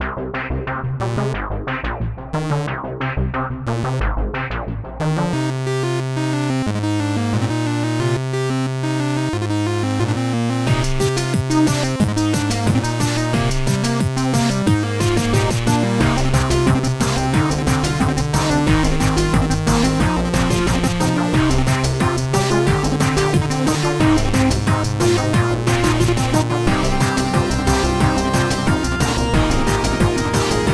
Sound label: chiptune combat; troll combat